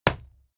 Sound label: fight impact sweetener bright; krieg-waffen; nahkampf; soundpool